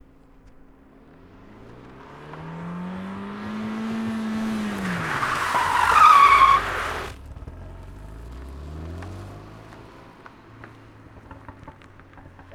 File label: megane rs u turn, Sport car; Chupapsound